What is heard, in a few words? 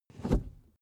suv pull back gear straight; verkehr transport; autos